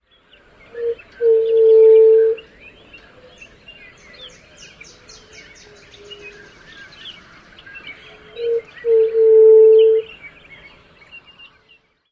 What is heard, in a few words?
songs